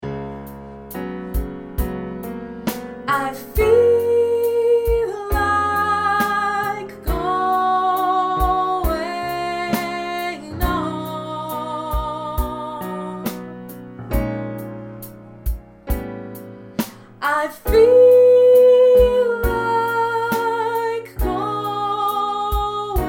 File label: sop, Soprano